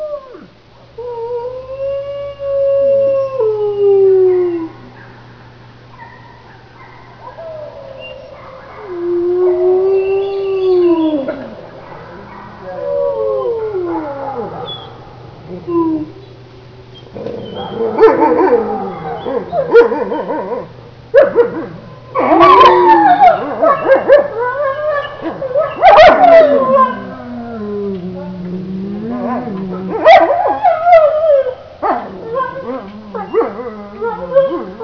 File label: wolf song; quake2